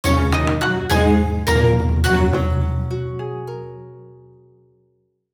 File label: effect jackpot win